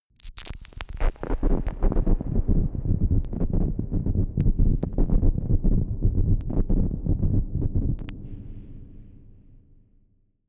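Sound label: crushed fake thunder, science fiction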